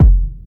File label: bassy kick drum sound f key